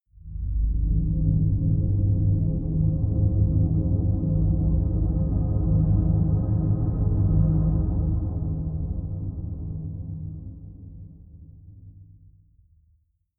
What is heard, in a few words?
drone rumble; musikelemente; drones